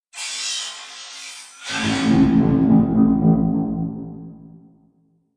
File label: Sfx animation win; Silent Strike